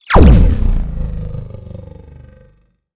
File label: player Decell For Border; Space Wave Race